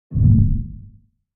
LFE Hollow Metallic Hit with LFE

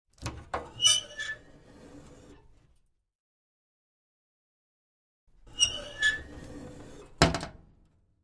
Door Metal Sml Lid Open

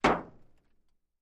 Metal Impacts Light, pipes

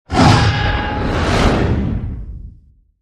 Animal Sting Growl; stingers & whooshes

